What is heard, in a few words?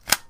reload; Hunters and Props